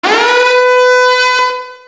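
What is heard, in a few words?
siren alert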